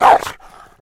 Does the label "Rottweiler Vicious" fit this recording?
Yes